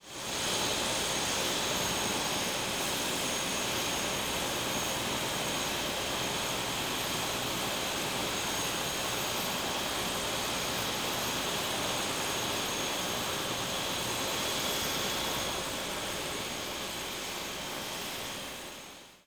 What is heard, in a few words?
Helicopter Idle; vehicles